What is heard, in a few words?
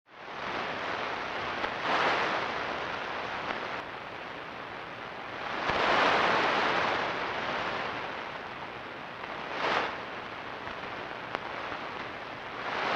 puffs